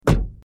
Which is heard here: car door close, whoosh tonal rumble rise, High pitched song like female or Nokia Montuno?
car door close